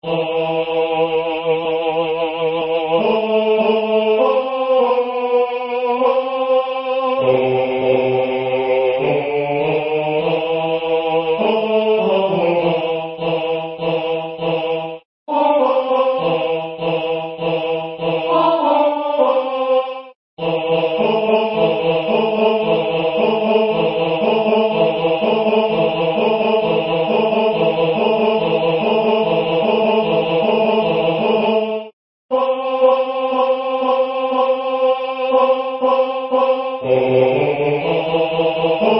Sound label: tenor